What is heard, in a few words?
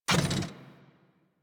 Mech Step Arm Up; Nechro Mech; Vehicles